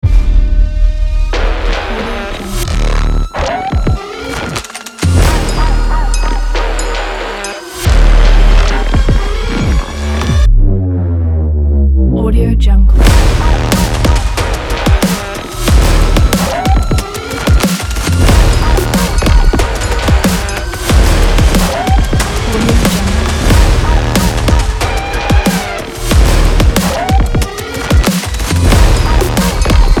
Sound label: modern hybrid trailer